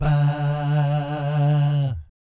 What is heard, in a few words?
VIB FX, Vocal Planet Jazz & FX; ILIO; JAZZCOMBO